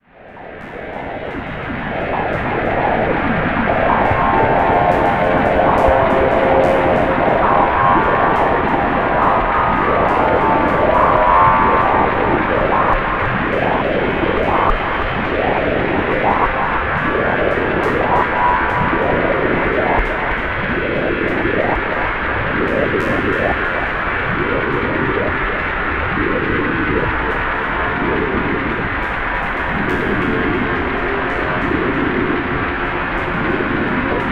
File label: Ear Fuzz; Satellite Hive